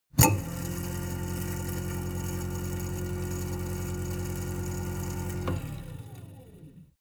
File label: garbage disposal; haus-garten; kueche